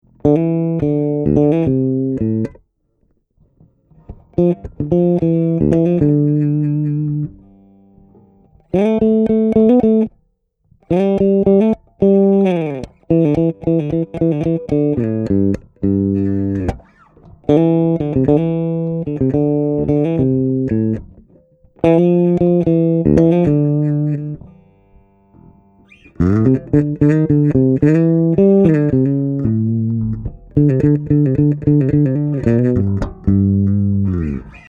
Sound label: LEAD